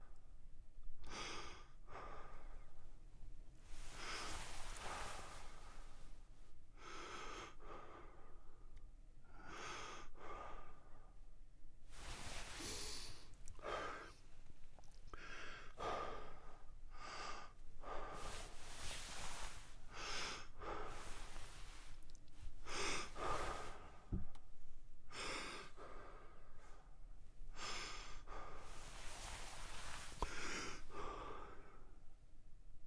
FARM DARKROOM

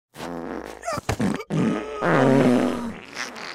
Fart Short; Goddess of Trampling

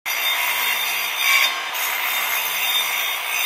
Buzz Saw Cut

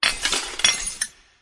City Crystal

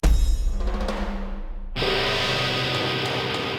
Action START

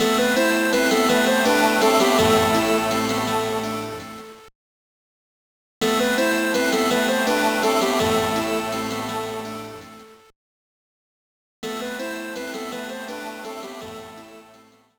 Death; Asterix and Obelix XXL; GBA

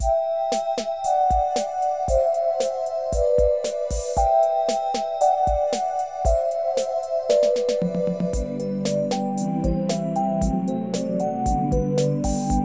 Snow; super mario maker 2; SMB3 Snow - Edit

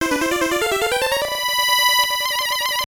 Level Start; Banishing Racer; GB

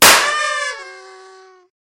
Easter Egg Pop; lethal company; ambience; windows

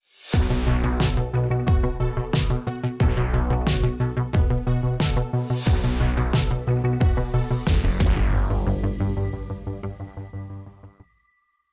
Ring Intro; Gangstar Vegas; Android

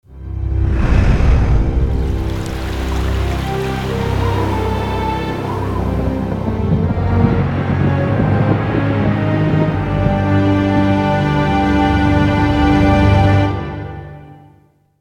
presto fanfare

